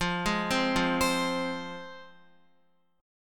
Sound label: F Minor, down, Guitar; arpeggiated; JGuitar